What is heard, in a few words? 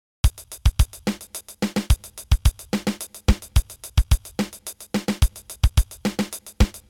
tictac, drums; groove